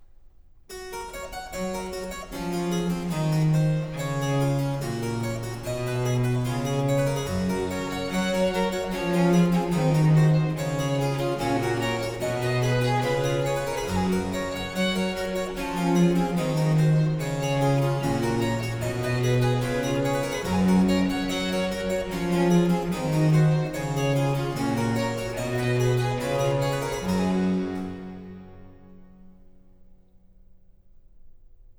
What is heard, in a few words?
Canons on the Goldberg ground (harpsichord solo) - Canon in unisono; Bach - Musikalisches Opfer BWV 1079; XIV Canons on the Goldberg ground, BWV 1087; IX. Canon in unisono post semi